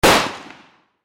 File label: warfare gunshot exterior; Machine Guns